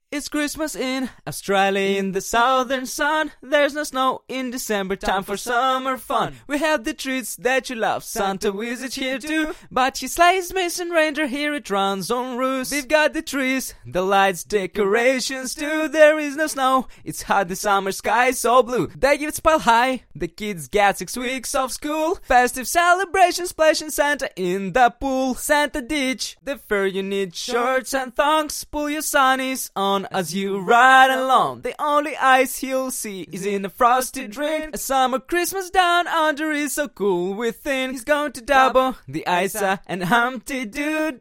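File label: hip hop pop festive singing 77 G minor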